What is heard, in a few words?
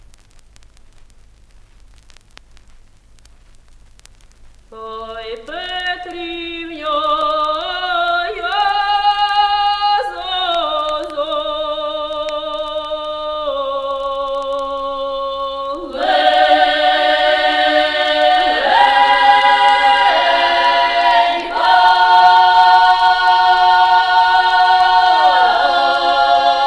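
Ukrainian folk choir; Ukrainskij nar. hor im. G. Verevki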